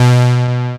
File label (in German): High Layer, baesse